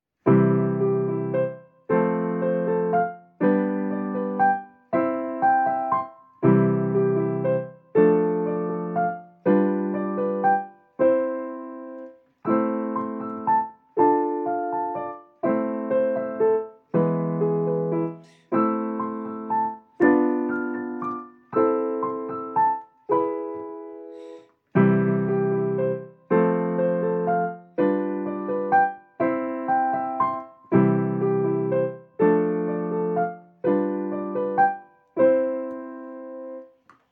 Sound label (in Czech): pedalove preludium c klavir; samuel majkapar